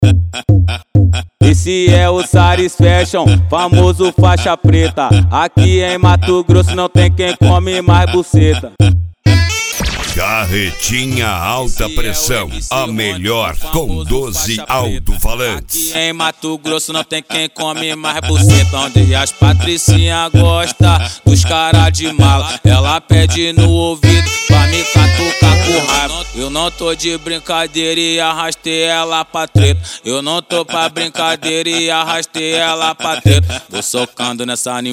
ritimada agressiva

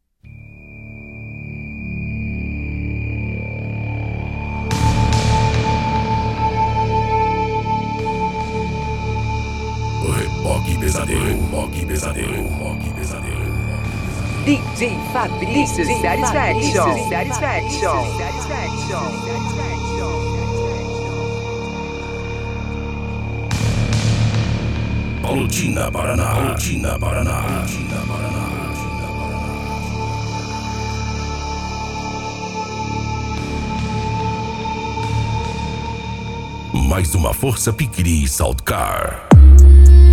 sound car

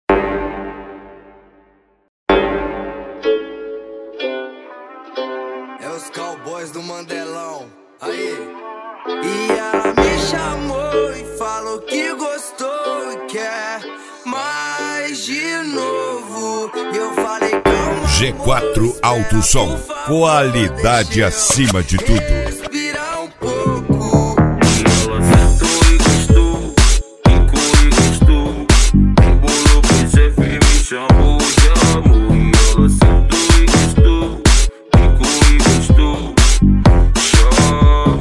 mega funk

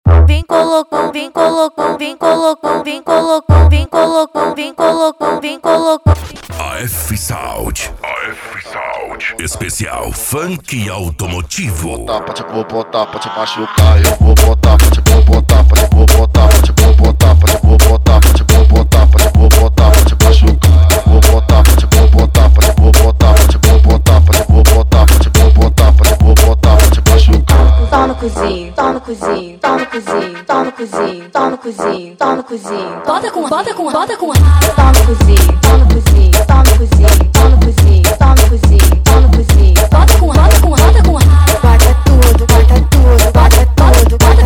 esp funk automotivo; afsound

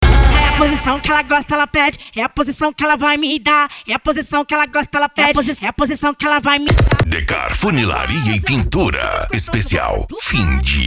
funk ritmado

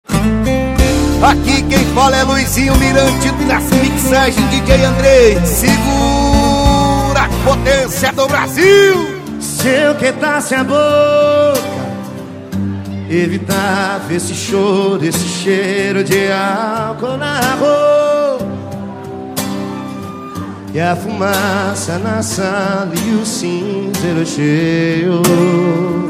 sertanejo com locutores de rodeio

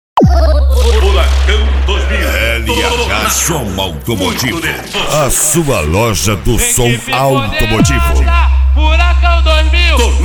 som automotivo

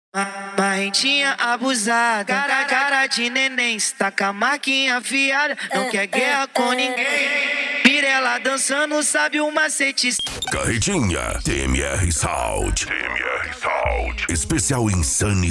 esp insane sound

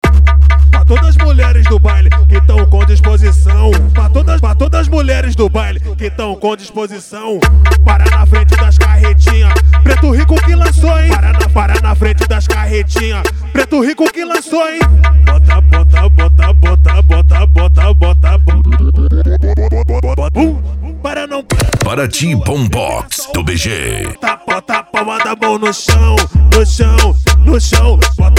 bombox funk

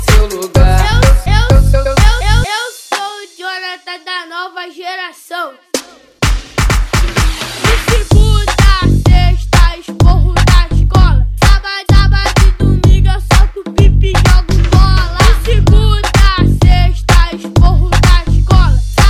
funk mega funk

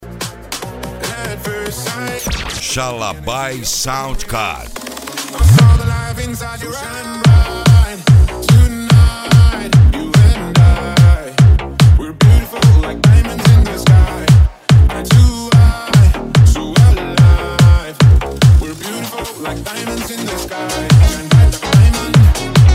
sound car mala aberta e mega funk

